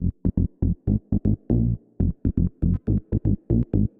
SH101 Bass Loop (120BPM), retro house

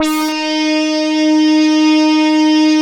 BURST; Big Fish Audio - First Call Vintage Keyboards

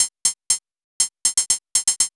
MOOG HAT; AMG - Vince Clarke Lucky Bastard

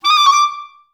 D5, orchestra; Best Service; Peter Siedlaczek's Advanced Orchestra

